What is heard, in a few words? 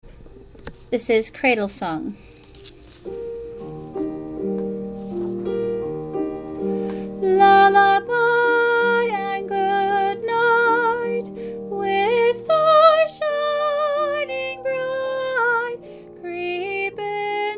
solo soprano cradlesong